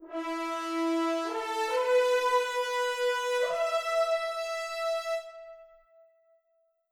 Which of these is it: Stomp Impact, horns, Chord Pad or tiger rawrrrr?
horns